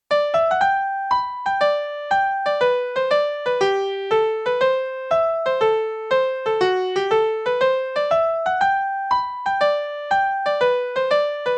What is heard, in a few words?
Steamboat Hornpipe; Malkin Morris